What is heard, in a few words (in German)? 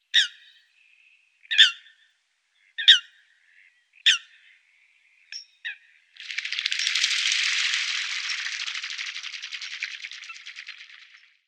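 Blässhuhn, Vogelstimmen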